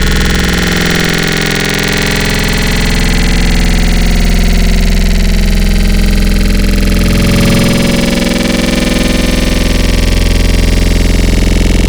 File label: Growl Mirror, Wavetables; Cymatics; Wavetables Collection Vol 3; Serum